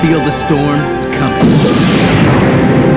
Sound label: storm